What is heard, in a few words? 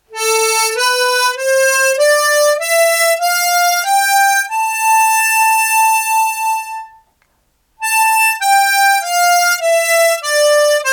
natural minor scale